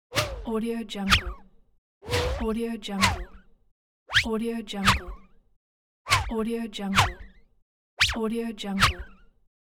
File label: fast whoosh transitions